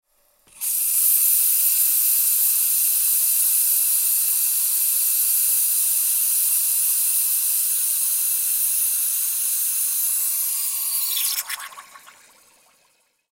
Espresso Bubbles, Espresso Bubbles Steam Long Gurgle; Industry Restaurant; Household; Drink Mixing; Blastwave